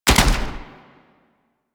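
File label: submachine gun Alt Shot; Weapons; Warframe; Tenno; Sundial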